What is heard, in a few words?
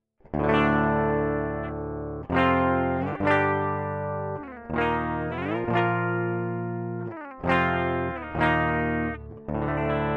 DI track